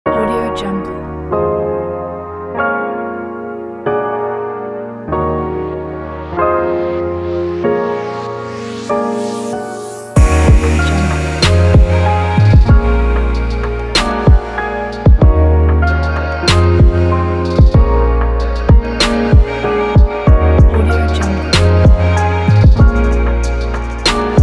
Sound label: smooth lofi